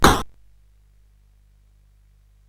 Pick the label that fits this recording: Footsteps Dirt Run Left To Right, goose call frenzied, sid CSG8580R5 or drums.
sid CSG8580R5